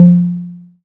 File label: SERGE Tom Med, drums; Polyend; Stazma & Concrete Collage; Concrete Collage Drum Kit; Concrete-Serge Drums